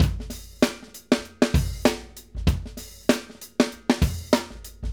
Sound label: Acoustic Live Drum